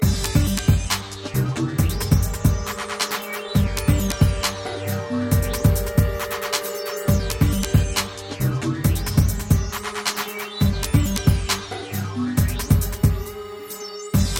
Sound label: DUBSTEP